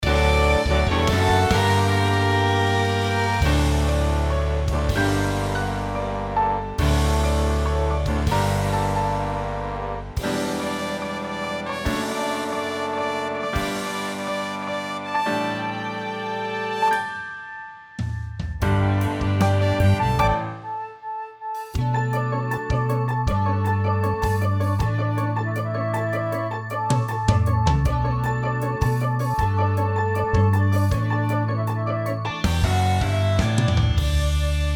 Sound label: accomp